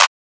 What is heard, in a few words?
Claps; FD - Portland